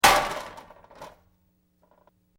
Metal Debris Impact Short Medium; Small metal junk crash. Tools, pots & pans or metal parts small impact with rat

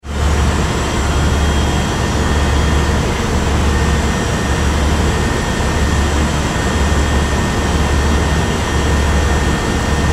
Ship Large Car Ferry Engine Room; Tom Hutchings

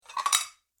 Medium Side Plates Moved Apart; dishware; household

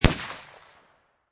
Pistol Rhuger 357 Single Shot Exterior At 45'; Gun / Pistol: Rhuger 357, Single shot, Exterior, at 45 feet distance

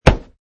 Kick

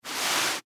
Rag Wipe, Foley, Rag, Wipe; Cleaning; Household; BlastWave

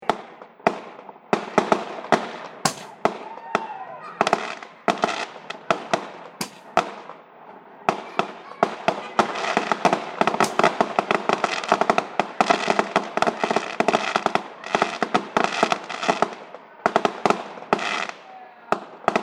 Fireworks Large Celebration And Car Horn

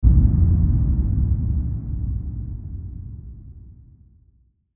Blast Distant Shockwave; Production Element Imaging Element Accent Transition; Blastwave

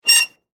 Squeak Metal; Texture; Squeaks; Sound Effects:Objects

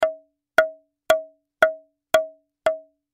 Hammer Hit Wood, Hammer Hit Wood Multiple Dull; Hammers (Sound Effects:Tools); Blastwave

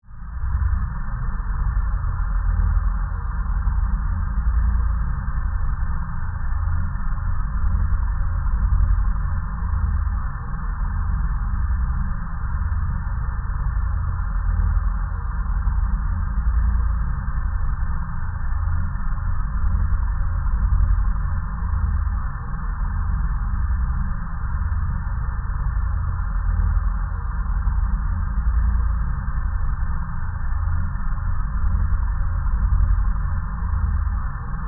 Drone Alien Power, Source, Scifi; Science, Fiction

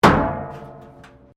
METAL BIN IMPACT PLASTIC CRASH BARRIER

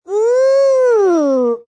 Ooo, Exclamation, Female; VoiceBox

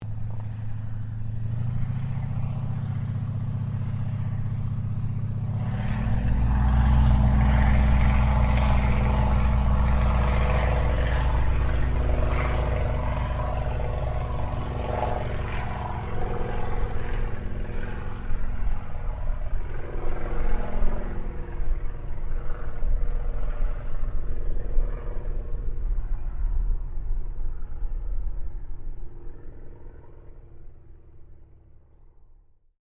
AIRCRAFT YAKOVLEV YAK-52 TAKE OFF; Russian Yak 52 Training aircraft; Airplane Sound Effects; Airplanes (Sound Effects:Vehicles)

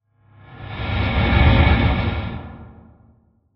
Scary Horror Transition; Scary Soundscapes; CraftedSignals

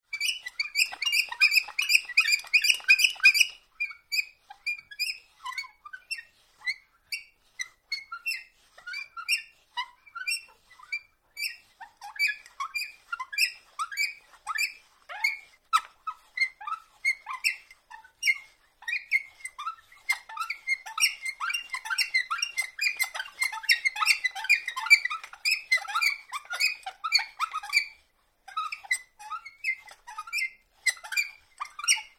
Winding Handle Turning With Squeaks; Victorian table handle extending table; Tom Hutchings

